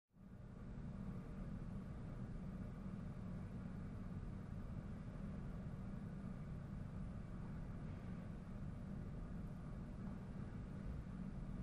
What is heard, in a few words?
Large Very Quiet Room With Light Ventilation Noise.